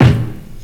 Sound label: Kick, Drums Percussion; Zero-G; Total Drum Bass